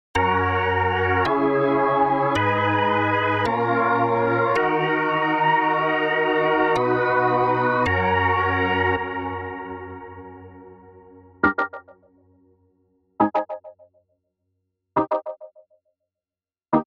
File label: Chords Reggae; Unison; MIDI Wizard